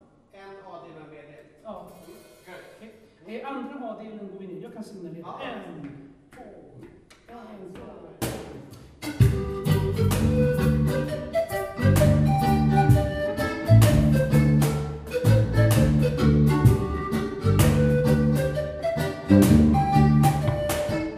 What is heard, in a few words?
reggae; Folkmusiken